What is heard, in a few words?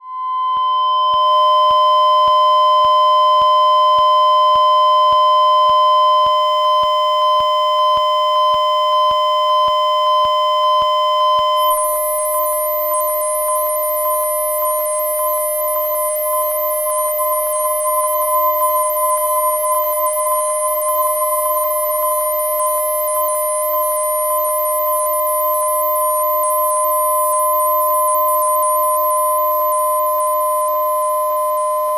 Sinewave Project